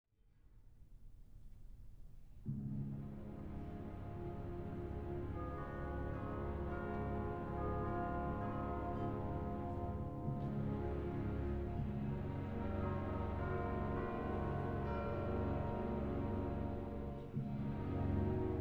Carmen, ballet for strings & percussion, Introduction; Orchestre Philharmonique de Monte-Carlo; after Bizet; Rodion Shchedrin